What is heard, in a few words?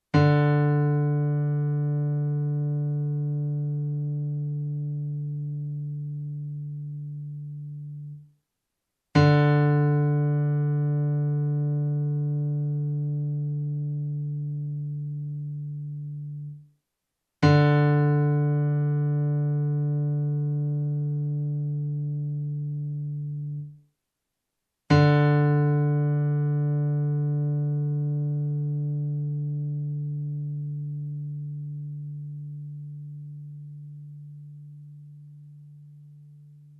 4th string D, Teaching Clips